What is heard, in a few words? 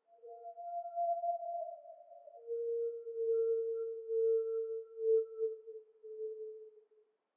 wolf